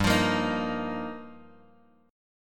G Minor 7th Sharp 5th, Bass Standard; Gm7#5; strummed; JGuitar

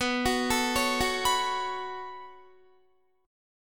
B 7th Suspended 2nd B down Guitar; B7sus2; arpeggiated; 4E,4A,5D,5G,5C,6F

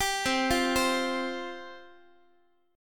C Suspended 4th C, Guitar; Csus4 arpeggiated; x,x,10,10,9,11

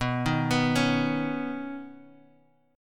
B Major Add 9th B, chord; Badd9; arpeggiated; JGuitar

